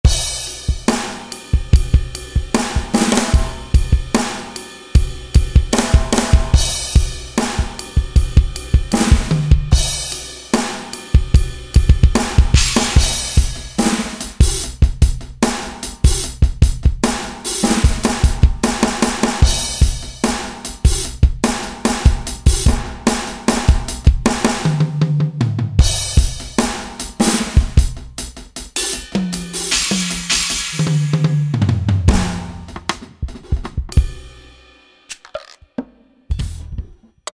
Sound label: Slam Kit; Roland SRX-07 Ultimate Keys